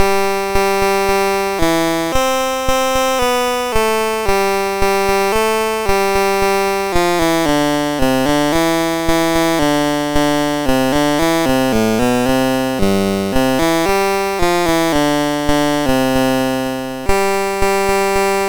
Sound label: MOS6581R4, GAMES